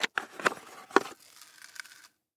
zvuki ruchnogo pylesosa

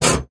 zvuki tsarapin na metalle

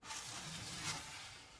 zvuki s effektom peremeshcheniia videokamery

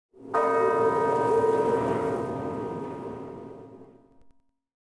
zvuki dikogo zapada

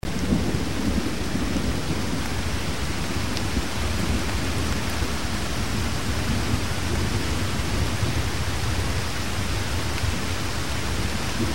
3d zvuki dlia naushnikov stereo

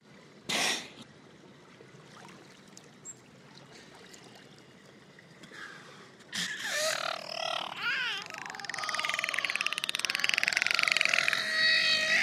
zvuki belukhi

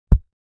zvuki postukivaniia paltsem po mikrofonu

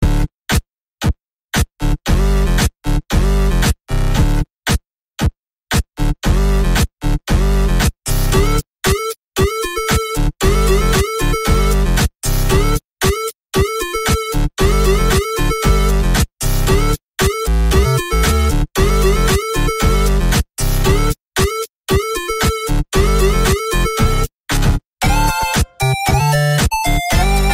8-bit muzyka na sotovyi telefon ringtony

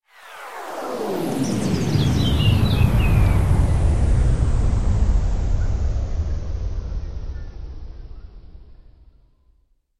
zvuki s effektom peremeshcheniia videokamery

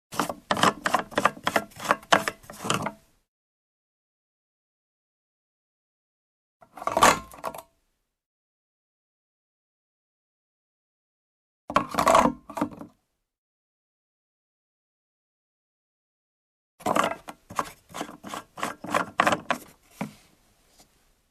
zvuki kanistry

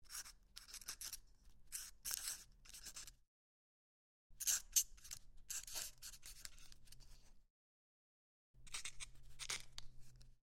zvuki perevoi ruchki i chernilnitsy

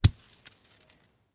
zvuki beisbola

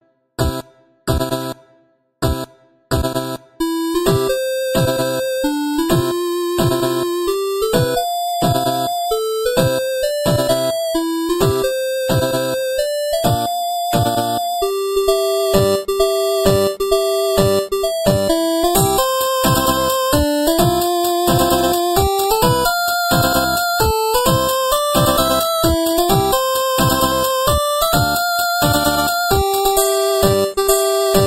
8-bit muzyka na sotovyi telefon ringtony

